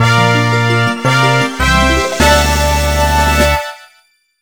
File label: Ranking Fanfare; Nakayoshi All Stars Mezase Gakuen Idol; NDS